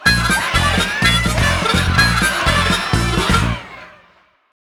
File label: Jingle, You win!; rayman raving rabbids playstation 2